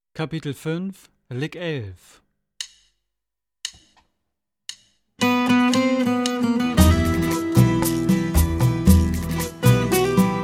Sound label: Latin Style Guitar